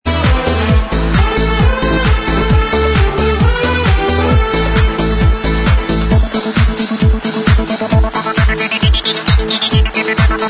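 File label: Instrumental Track, backing tracks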